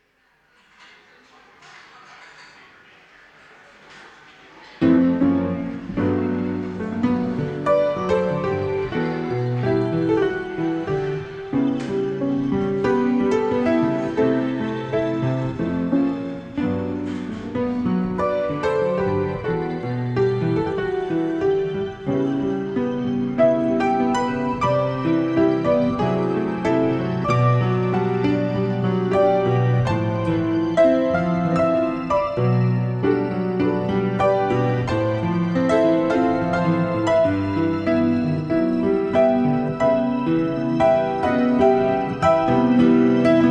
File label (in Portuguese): interludio